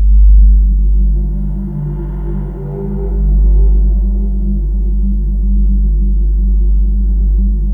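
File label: atmosphere; Sound Gallery - Charged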